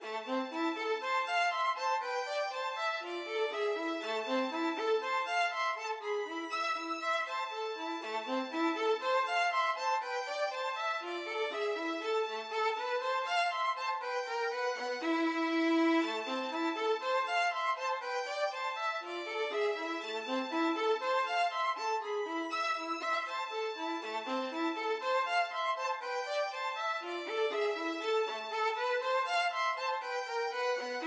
dvorak violin duet

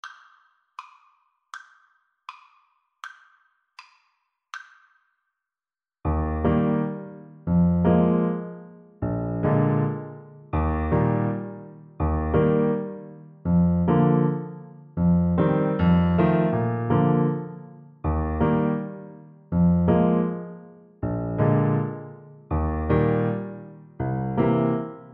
Euphonium

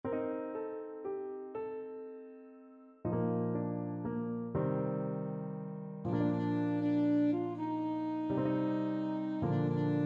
ASAX, saxophone

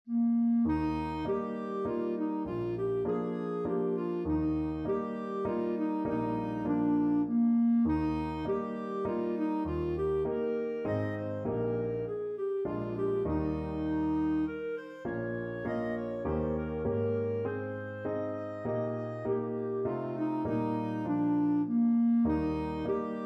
afton water clarinet

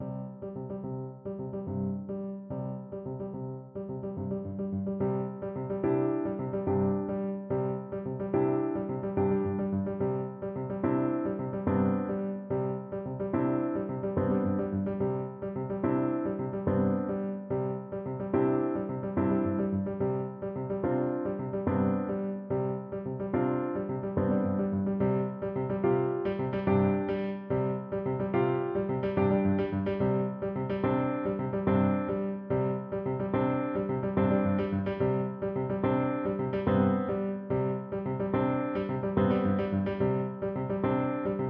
violin

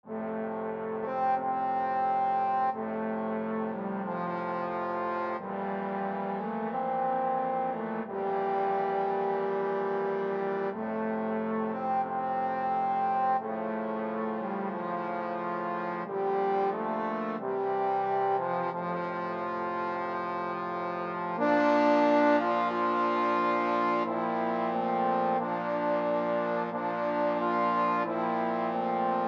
largo, trombone quartet

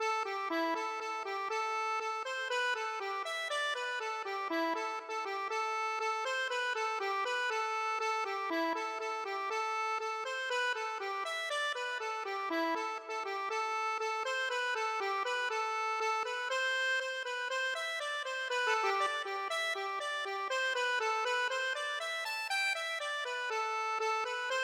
accordion

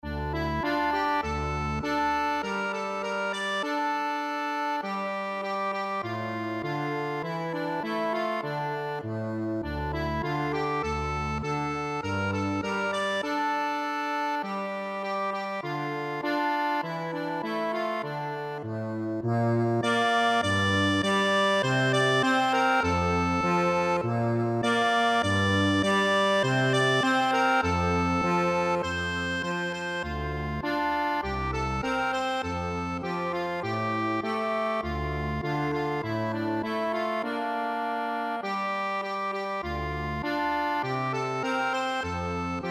israel national anthem, accordion

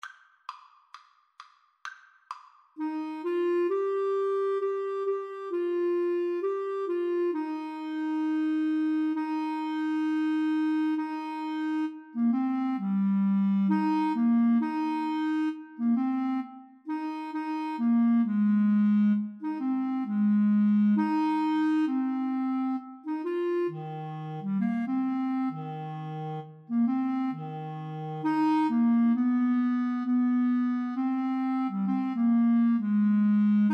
clarinet saxophone duet

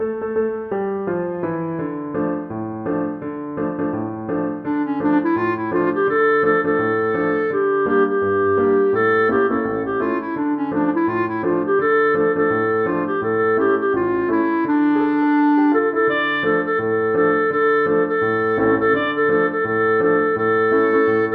joshua fit the battle, clarinet

